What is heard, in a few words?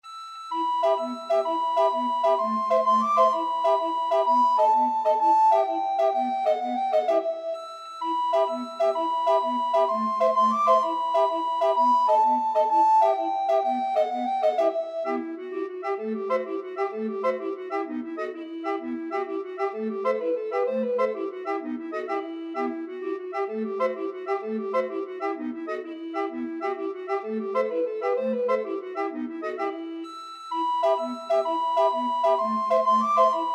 recorder quartet